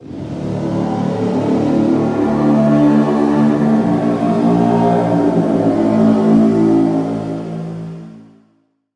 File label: Pad - Dark, Serum 2; Pad - Dark Field; Routed Sound; Serum 2 Expansion - Chaos Bringer Vol.1